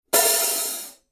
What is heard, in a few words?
900 series 14 hi hat open